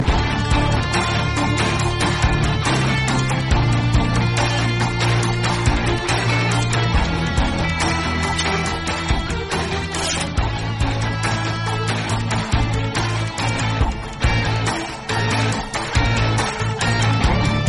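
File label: background music, ringtone; ajith billa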